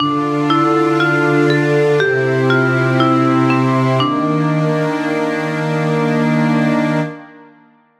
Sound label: gameover